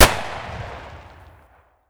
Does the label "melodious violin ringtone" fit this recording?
No